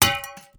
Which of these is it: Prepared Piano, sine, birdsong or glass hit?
glass hit